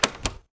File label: button